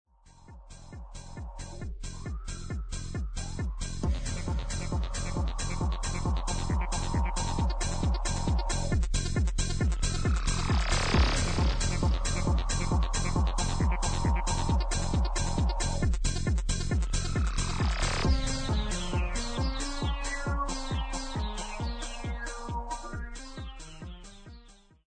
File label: Trance; Doom II